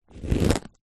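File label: lemon or lime sounds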